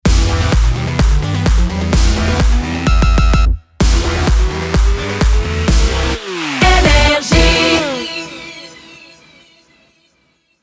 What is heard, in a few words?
Jingle Ramp; NRJ